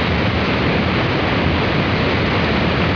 WATER, FOUNTAIN; FLATLAND

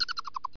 IMP LAUGH; JOHNNY

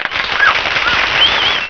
CLAPPING

